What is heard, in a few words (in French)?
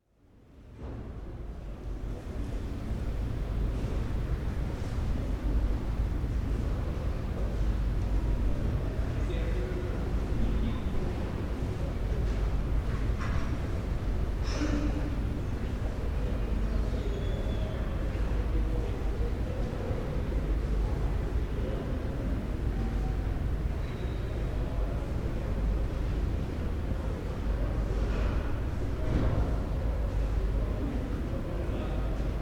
salle attente